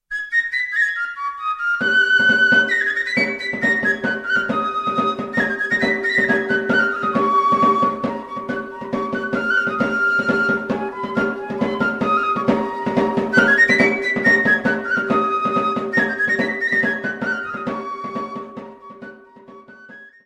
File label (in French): Fandango - Arin Arin, Pays-Basque